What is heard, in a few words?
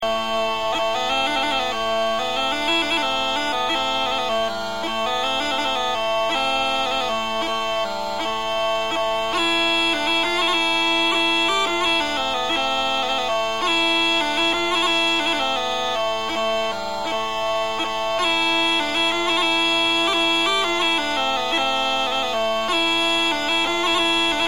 weepipe melodie